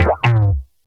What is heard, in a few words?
Bouncer, 8-Bit; 8-Bit Kit Volume1